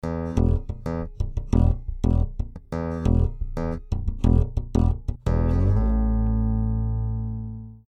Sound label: GTR EQ BYPASS